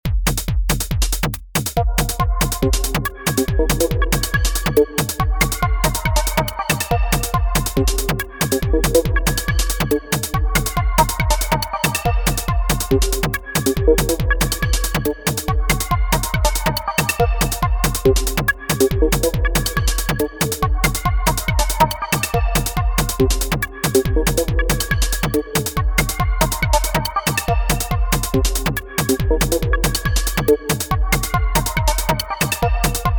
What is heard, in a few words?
techno